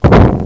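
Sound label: tiny explosion; PBALL95; 501 Great Games - Volume One; Guildhall Leisure Services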